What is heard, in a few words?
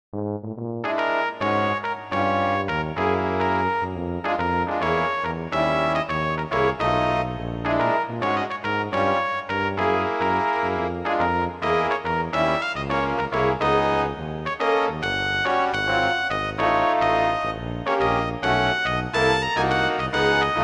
brass quintett bongo blues